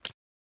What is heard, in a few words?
Raindrop